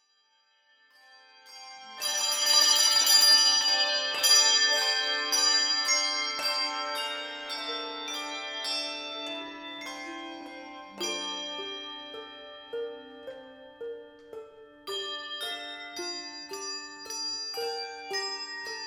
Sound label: Tenby handbells